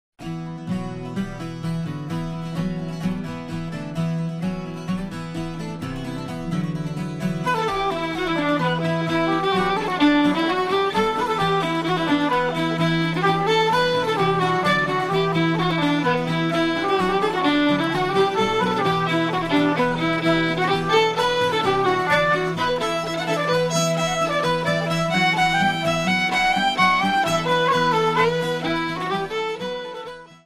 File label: polka